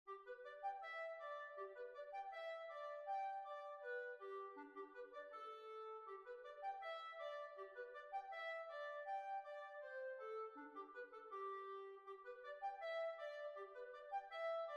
Holywells Hornpipe B flat

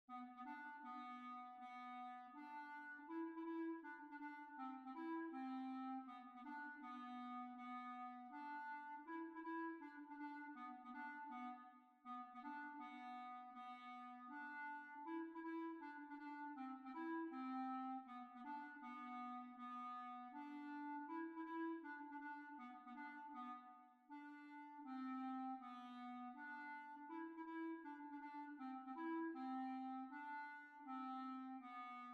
Hornpipe; Sadlers Wells Hornpipe; B flat

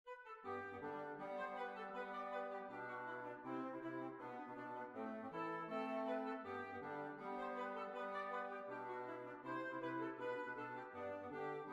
Hornpipe; Sadlers Wells Hornpipe

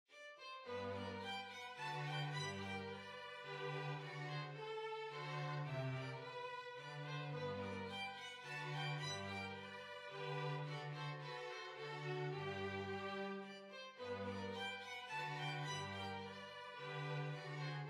Altenberg Waltz

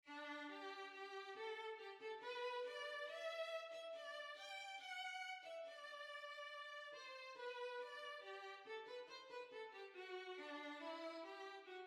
Violin

